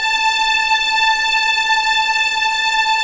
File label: A5 EXP MF, VIOLINS; East West; The Ultimate String Collection